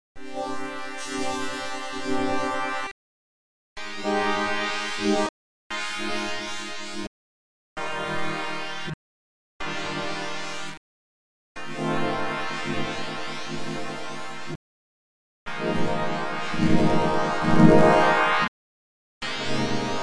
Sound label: fm resonator midi